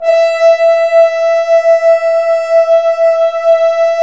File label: BRS FHRNF, F.Horns, FHns Dry f; Roland LCDP06 Brass Sections; F.Horns 2